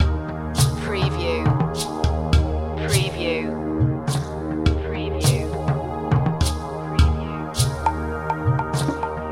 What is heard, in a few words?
mountain fog loop